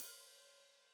Hat Foot Open, Hi-Hat

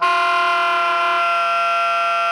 SOPRANO F 4, Saxophones, SOPRANO GRWL; Greytsounds; Philip Wolfe David Crozier's Saxophones

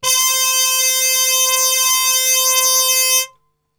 C 3 THM, Brass Super Section, TRP HAR MUTE; Best Service; 90 s Sample CDs

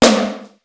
Simmons SDS2000; SDS2000 Factory5

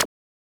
Tech Zip; Genesis

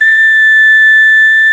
ocarina; World Combo

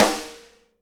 SNARE RIM, Live Snares

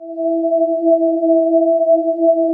GIRLS CH, Synclavier Sampler

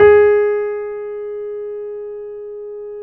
BOSENDORF

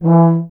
tuba; Miroslav Vitous - Solo Instruments VOL-2

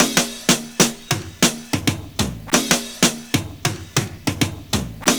ANALOG FILL, Kitch 70s; Univers Sons - Kitch 70s

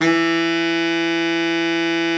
BARITON, BARITON SAX; Best Service; Brass Super Section

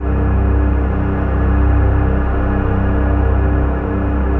AMB LO WAS; Synclavier Sampler Library; KEYS AND GUITARS

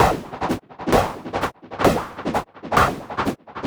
Modular 130BPM; noise hiss crackle

